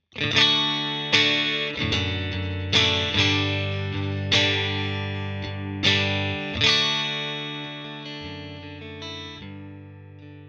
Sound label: amp studio clean; guitar